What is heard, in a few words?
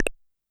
click; Unity WordConnect project; WordConnectGameToolkit